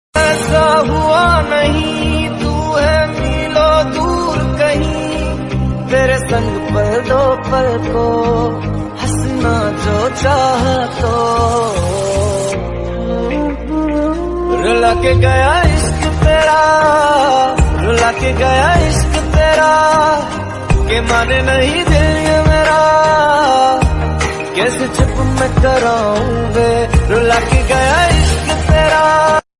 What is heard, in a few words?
Sad Version Ringtone